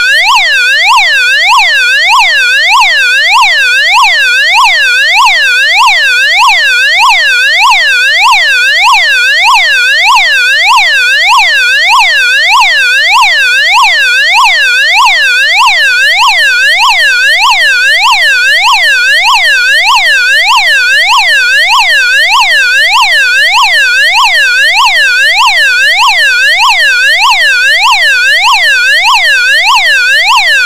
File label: special warning